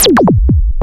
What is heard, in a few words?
FX, attacks